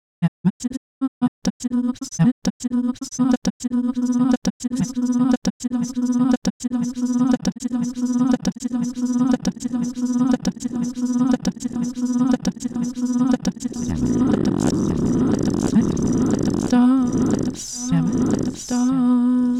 Memory Recorder Crazy Echo

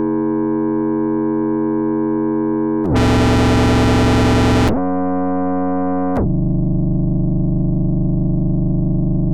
Analogue style Ring Modulator

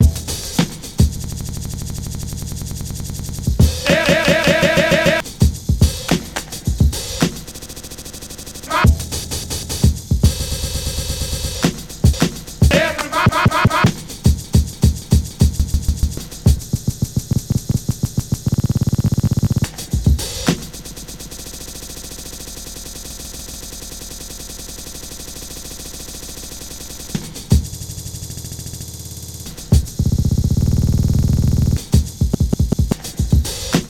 Beat Repeat; JonBellona

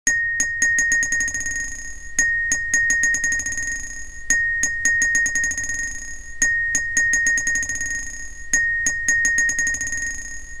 Bouncing Ball, delay

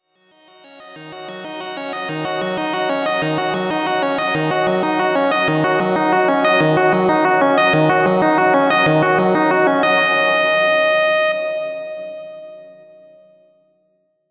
Prophet VS Morph

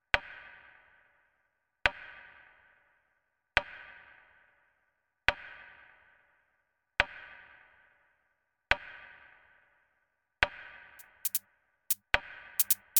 Rhythm Computer; NeverEngineLabs

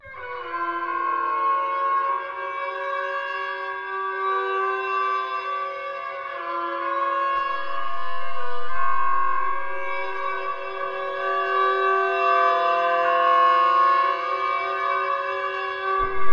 MouthPiece Time Freq Multiplied

